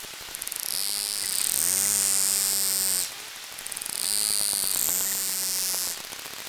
G griseus

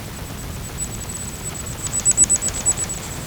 rhino

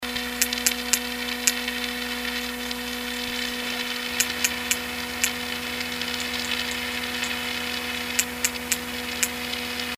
P macrocephalus codas

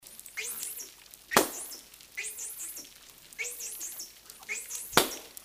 T truncatus bang

